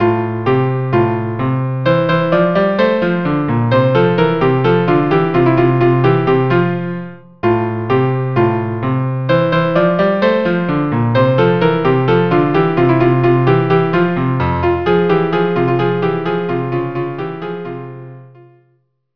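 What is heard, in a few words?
viola cello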